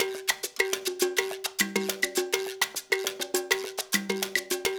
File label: LOOP, Percussion; InVision Interactive; Percussion Slam; SULTAN